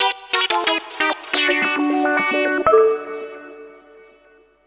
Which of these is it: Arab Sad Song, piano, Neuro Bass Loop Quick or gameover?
gameover